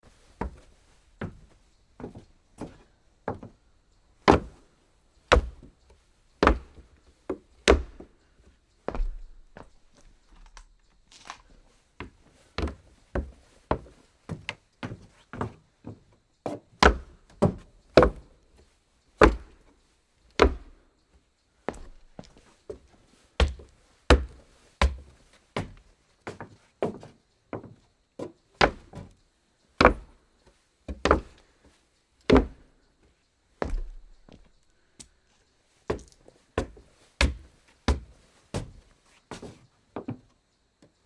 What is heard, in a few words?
walking on wood